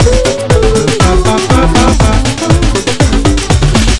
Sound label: disco loop; Team Awesome